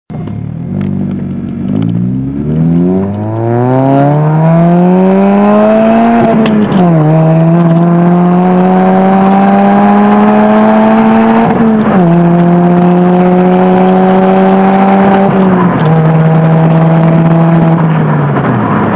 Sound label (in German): mini beschleunigung; mini cooper